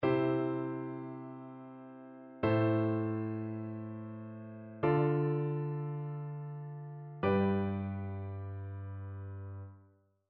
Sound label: harmonic dictation; aural skills i